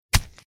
impact blunt

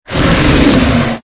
chill king attack; mobs

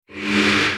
wand autoattack cast

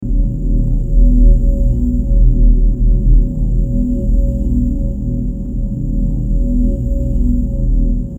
henge gate

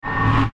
abilities close

